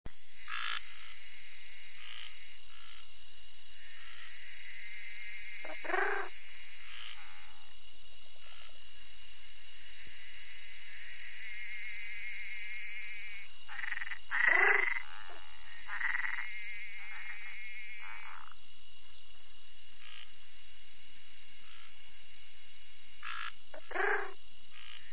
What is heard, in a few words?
Bokermannohyla luctuosa